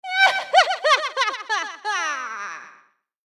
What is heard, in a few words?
halloween witch sound creepy laughter